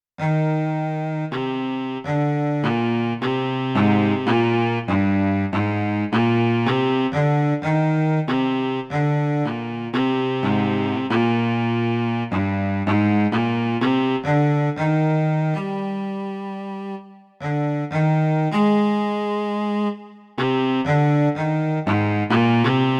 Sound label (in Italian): Axon 100, chitarra midi